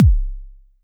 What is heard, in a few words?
BD; TRX